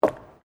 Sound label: FOOTSTEPS WOMENS LOW HEELS BOARDWALK STEP; Footstep Foley; Human